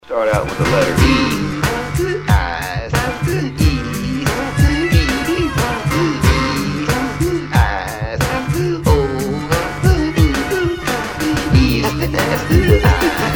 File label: Ringtone